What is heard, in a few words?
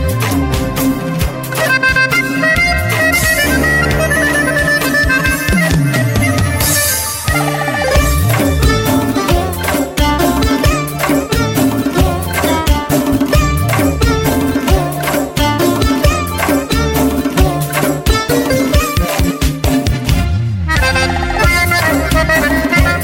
Accordion